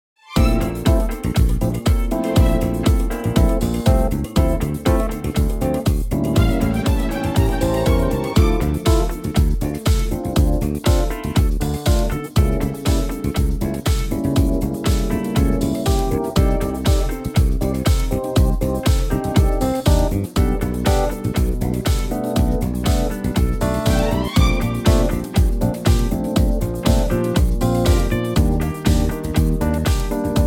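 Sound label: smooth D minor; key - Dm - vocal range - D to C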